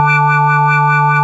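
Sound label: organ Vox D3, Continental, wah bar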